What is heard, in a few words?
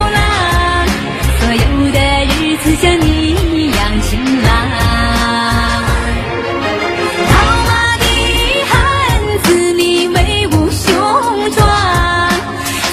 chinese song ringtone